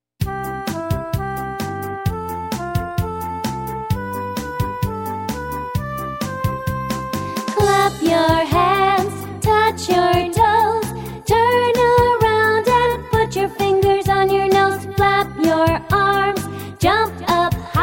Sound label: Clap Your Hands